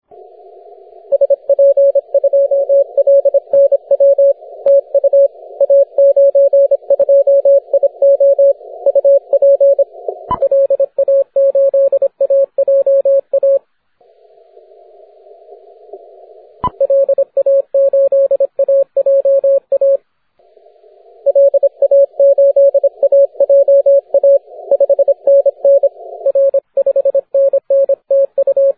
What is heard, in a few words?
15m cw